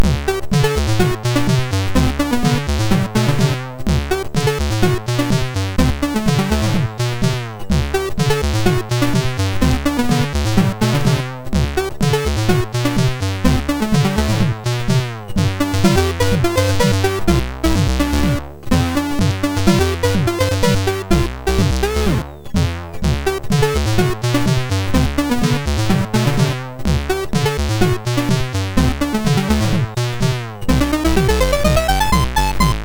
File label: Boing Doing, sid MOS6581R3; Evony